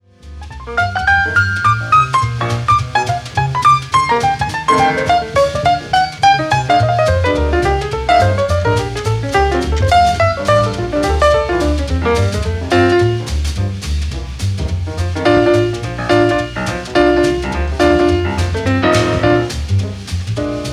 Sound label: jazz piano